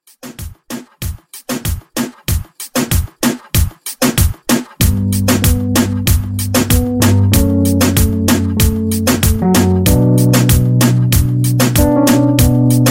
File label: Moombah Re Drum 95